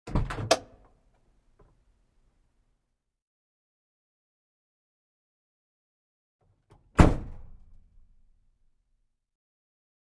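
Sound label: Truck cab door opening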